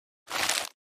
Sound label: Foliage Impact Hard; hits & impacts